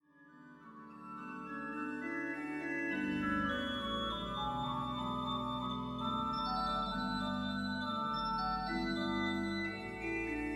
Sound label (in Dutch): Hinsz-orgel klankvoorbeeld; Appingedam